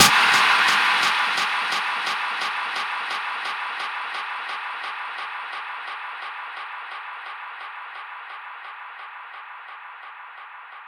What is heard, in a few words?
FX Downlifter